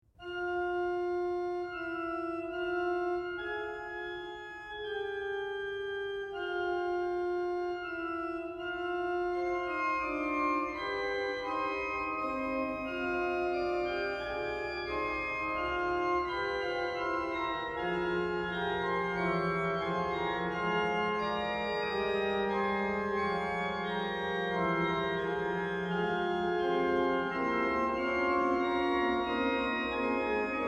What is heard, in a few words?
Fughetta